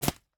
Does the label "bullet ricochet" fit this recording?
Yes